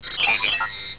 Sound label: beep; xfce